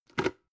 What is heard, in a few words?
plastic bowl grab